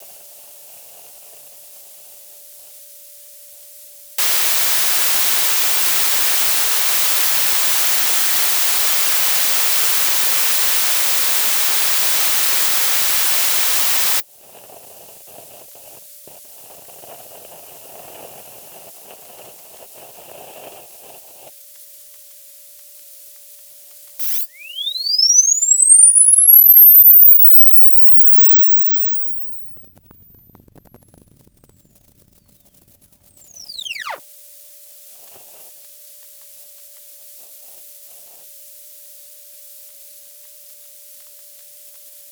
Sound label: pool test signal, hydrophone